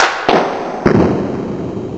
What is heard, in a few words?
Tamb claps; Instruments